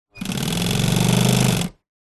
industrial sewing machine operate burst walking foot; brother; zapsplat